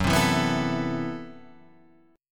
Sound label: A Minor 6th Add 9th F sharp, Chord